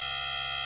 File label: error